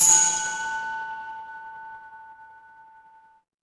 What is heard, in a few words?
instrument bell